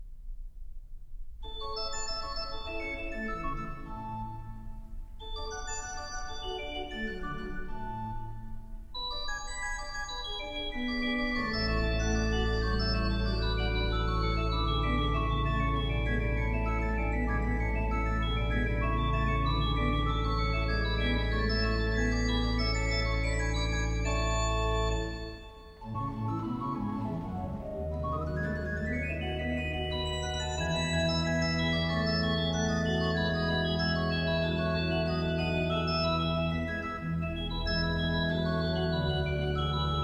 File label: Prelude in A major, ORGAN; BACH; Toccatas, Fantasias, Preludes, Fugues